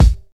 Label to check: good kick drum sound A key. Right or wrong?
Right